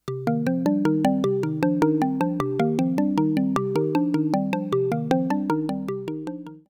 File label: marimba